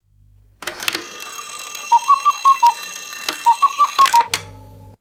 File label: mechanical cuckoo clock chiming four times; clocks